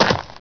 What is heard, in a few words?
LEAVES